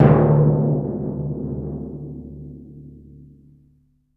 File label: TYMPANI ROLL; Greytsounds VOL-1